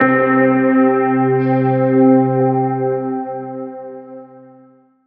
tonal one shot C; Pop; Slate; PASADENA Pop Sample Pack; Tonal One Shots

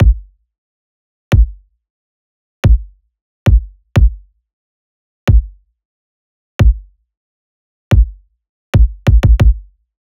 kick loop 91; Pop; Drums; Kick Loops; belday; Slate; SD PASADENA Pop Sample Pack

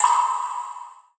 fx oneshot ocean; Pop; Drums; Slate; SD PASADENA Pop Sample Pack

